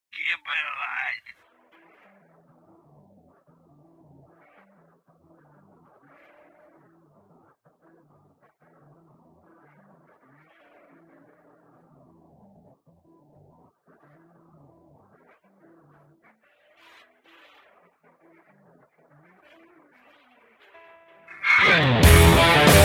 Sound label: Merlin P.A.F A5 bridge